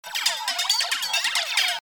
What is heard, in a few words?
percussions